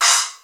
sfx perc

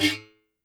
Berimbau; YAMAHA RM1X

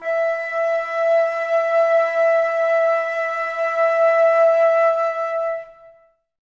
Flute, Woodwind

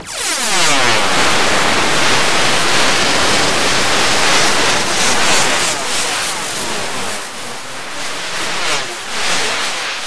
SOLAR WIND, SYNTHET; USB Soundscan vol.33; AKAI; Disjointed Textures

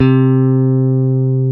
GTR, Dual Guitar; Club-50 - Foundations; Paul

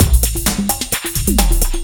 Beat, Drums & Percussion; Electrocution; Beats

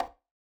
Conga, 120bpm, Old Skool House; Big Fish Audio; Construction Kits; House of Amnesia

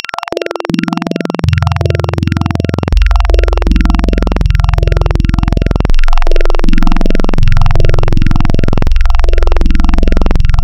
Add BinAdd Oct, wavetables Additive; Architecture 32

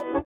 SITAR; Spectrasonics; Metamorphosis; INDIATEK

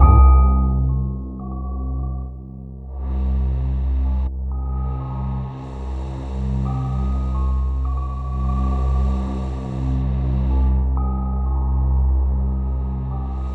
C2, Synth Line; 90 s Sample CDs; NiceBeats; SynthLine VOL-17

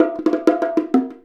Bongo; Essential Percussion

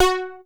arpeggio; Masterbits; Vintage Voltage; SEQ-FX

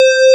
Square Chorus C4; Digital Sound Factory Xtreme Lead

